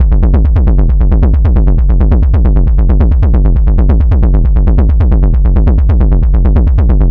Bass Loop B 135, Techno

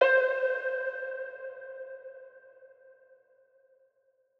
PLUCK Vintage Circuit (C); One Shots; Orchid

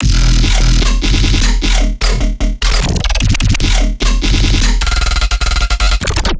Bass Loop 150 E; Trap and Dubstep; Savage; Ghosthack; Free Trap and Dubstep Samples 2018

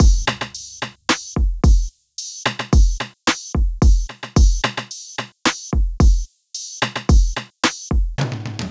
Full Drum Loop, 110 BPM, Hip Hop; Drums; Humble Hip Hop Sample Pack

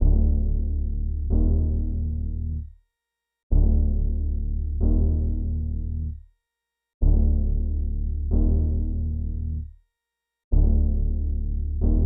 137 BPM D Min Bass, Melody Loops; Synth Collection; Cymatics; ANALOG EVOLUTION Beta

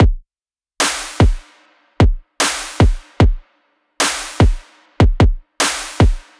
Future Bass 150bpm; Drums; EDM Drums; Drum Kits; Stems; Ghosthack; Ultimate EDM Drums; Drum Mega Bundle; Future Bass Kit 17

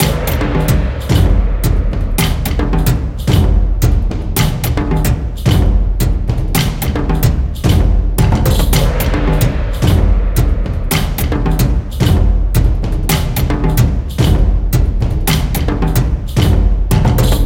110BPM, Percussion; Ghosthack; SkyRealm; Percussion Kits; Afterlife

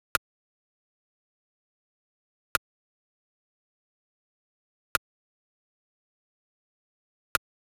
Drum Loop 100 BPM Percussion; Loop Stems; Cymatics; GHOST (Beta Pack); Flow Drum Loop

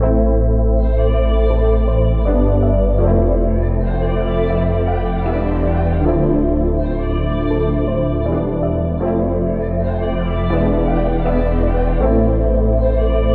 160 BPM A Min, RnB; Cymatics; 2022 Melody Collection; RnB Pt 2

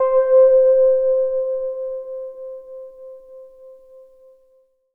Prophet 5 KEYS Relax High - C; One Shots; Cymatics; Fantasy Synth Sample Pack

